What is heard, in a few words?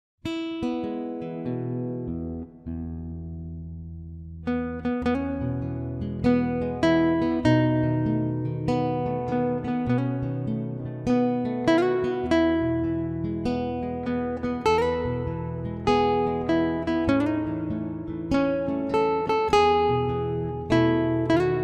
birthday music